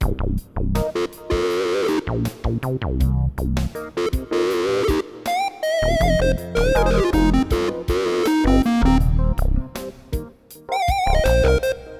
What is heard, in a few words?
mini bass and memorymoog guitar with mf 101